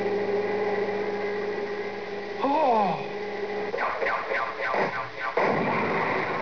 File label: jump